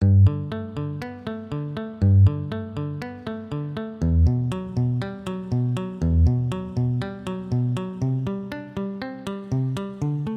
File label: Riff, guitar